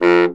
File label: B SAX STC F1, Baritone Sax Stacc; Orchestral; VarazdinOrchestral